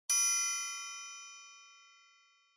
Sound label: Triangle Short